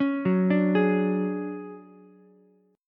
D Major Sharp 11th, D, down, Ukulele C6; arpeggiated

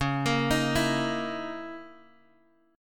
Db Minor 6th Add 9th Db down Guitar Open D

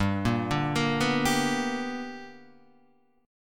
B Minor Major 7th Sharp 5th

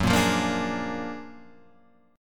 F sharp Augmented Major 9th F sharp; strummed; 2,1,3,3,3,4; JGuitar

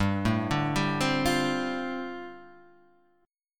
G 7th Suspended 2nd Suspended 4th; G7sus2sus4 arpeggiated

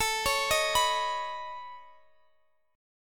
C sharp 7th Suspended 2nd Sharp 5th A

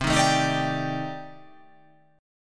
C Minor 6th Add 9th C

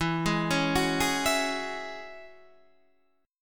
E 6th Add 9th E down Guitar Open D

